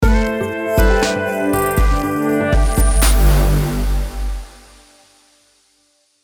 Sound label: electro glance logo